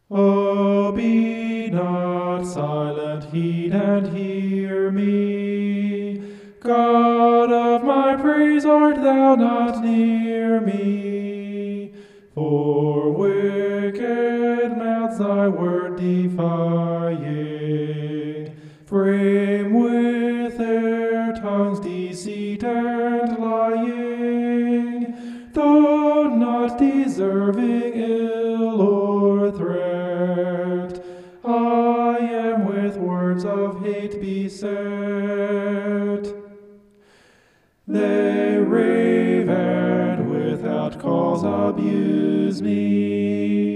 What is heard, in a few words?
vocal homoph; psalm 109